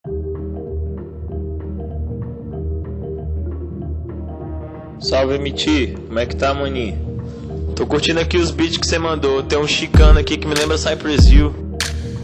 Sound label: (Intro Instrumental)